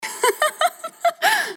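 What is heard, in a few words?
playful laughter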